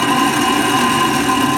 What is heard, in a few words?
rolling pipe; ball rolling game